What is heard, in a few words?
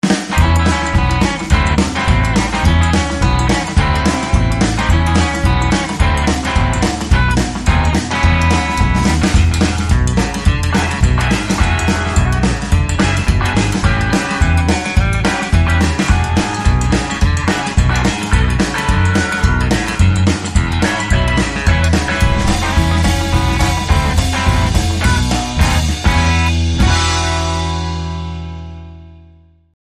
Swing